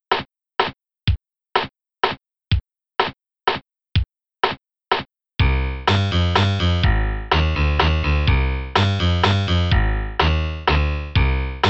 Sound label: In-Game Music; hero quest amiga